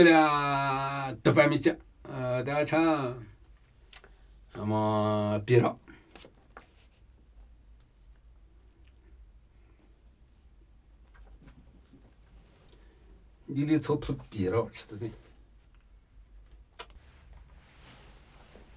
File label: Namuyi Tibetan Ritual Chanting; Ru lu tche su